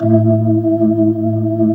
A2, Keyboards Old School; USB Soundscan vol.09; AKAI

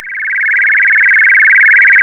A#, FX; Big Fish Audio

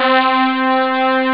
string; ST-XX Amiga Soundtracker Sample Packs

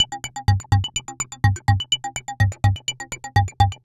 perc 125 bleeps, Percussive Loops 125 bpm; bleepsville

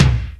ROCK PERC.

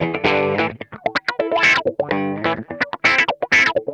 ROCKIN, Funk Guitar; 90 s Sample CDs; Zero G

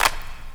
KIT, Dance Mega Drums; Best Service

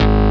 synth easy synth, dance synth; Sound & Vision - Gigapack I CD 2 (Roland)

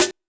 Break Snare; Drumset 04

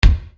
Bass Dr, EuroDance Bdrums

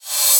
Reverse Cymbals

